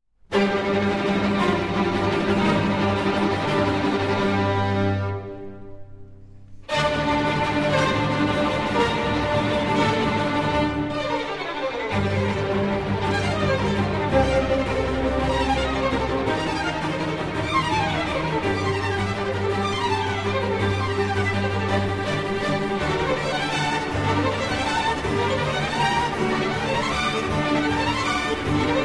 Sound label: Concerto For Violin and Strings in G Minor, Presto